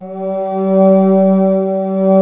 VFX VOIC; East West - Global Sounds

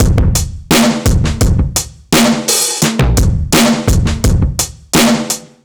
Old Drums 85; analogue circuit; musicradar

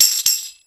EASY TAMB, Roots Disco; USB Soundscan vol.32; AKAI

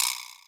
Vibr Slap, Yamaha DTXpress; Percussion

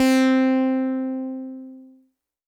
Synth C, Synth Prophet One Shots; Electronisounds

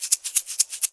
MULTI EGGS; Best Service; World Colours

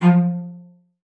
F3, CELLO SPICC; Xsample VOL-5

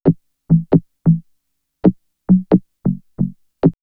Vocoder Loop; X-Static Goldmine; VocoderLoops

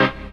HOUSE, House Dance

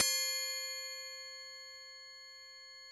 oriental C5, Yamaha VP-1; Music Store

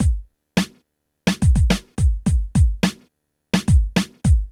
PHATT BEA, Hip Hop; E-MU Formula 4000 Series Vol. 1; Hip Hop Nation

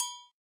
RX8 Ago LO, Yamaha RX-8

